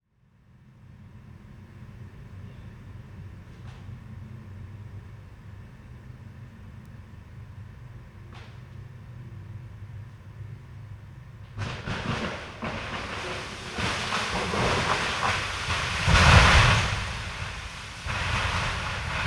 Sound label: Tree Crash